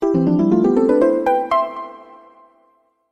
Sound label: fanfare